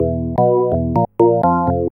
ORGN LICK, K E Y S

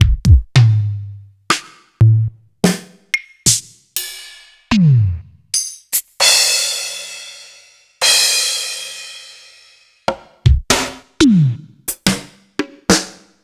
Casio CTK-5000, Samples Sliced

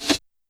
Rev HH, YAMAHA RM1X

